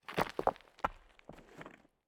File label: ambient; World of Tanks